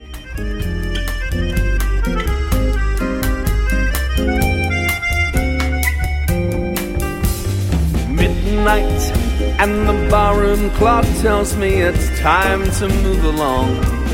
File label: bossa nova